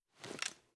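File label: pistol 1911 first raise chamber end; gmod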